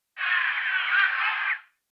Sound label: interpretive tonal